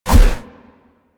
Heavy Melee Swipe B; Warframe; Weapons

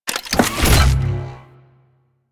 Arch Rocket Crossbow Equip, Weapons; Warframe; Archwing

